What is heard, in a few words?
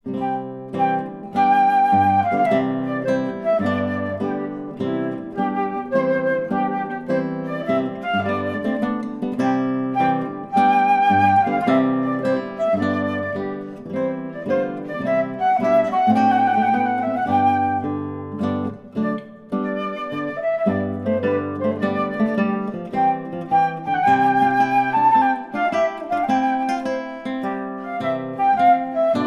trumpet tune, classical